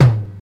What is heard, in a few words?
00s low mid tom drum sample a key